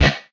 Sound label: Sci Fi Gun, retro arcade; MMRetroArcadeSoundsPack